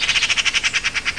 soroka pica pica

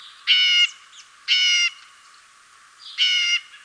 golubaya sojka cyanocitta cristata

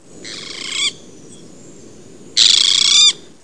lodkoklyuv megarynchus pitangua

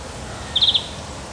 obiknovennaya zelenushka carduelis chloris

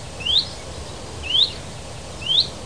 zyablik fringilla coelebs

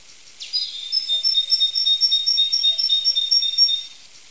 bolshaya sinica ili sinica kuznechik parus major

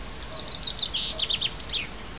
zyablik fringilla coelebs

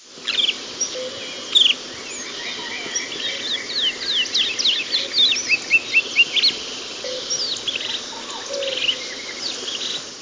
ovsyanka vyurkovaya izmenchivaya sporophila americana

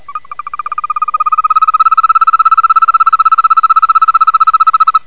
zelenaya zhaba bufo viridis

